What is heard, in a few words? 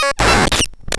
Prikle Glitch Rhythm; AFG Prikle Glitch Rhythm; Cwejman S1; DevSnd Cwejman S1 - JK